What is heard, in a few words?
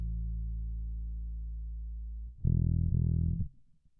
bass; musdb18